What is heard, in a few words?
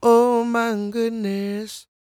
CROON, Vocal Blues & Soul MELLOW